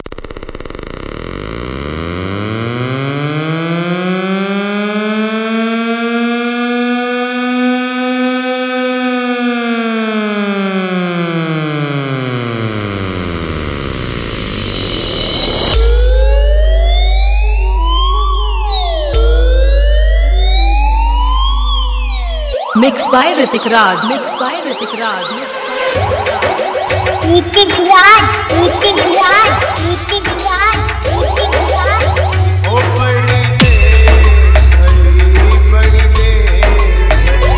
Full Vibration Police Siren Competition Remix Song